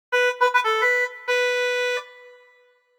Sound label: Sax; life is a game android